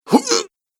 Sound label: Sumo Male Strength, recorded voice